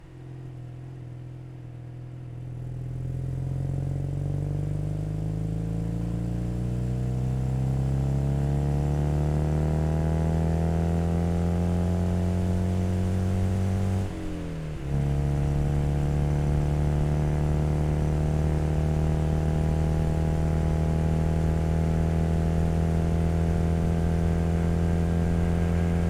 Citroen 2CV Highway drive Exhaust, Racing Car with Modified Engine; On The Fly Audio